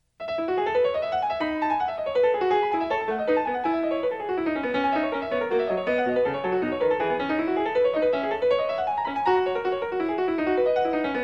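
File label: courante, piano; BACH; FRENCH SUITES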